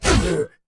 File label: Hit